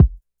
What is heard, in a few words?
Kick